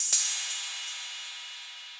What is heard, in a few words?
Perc; GrooveMaker demo; Serious Software; Macworld 1999-04